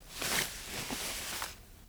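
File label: pocket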